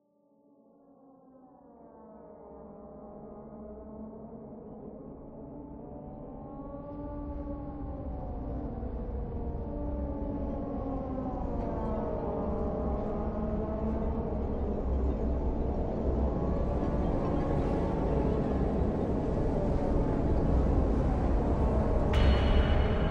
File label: Dark Suspense Fear